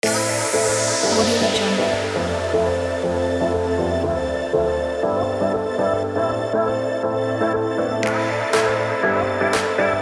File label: dance